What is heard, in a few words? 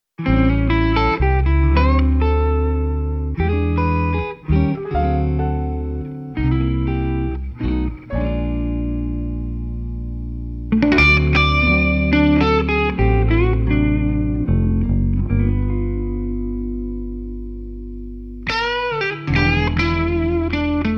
blues gtr medley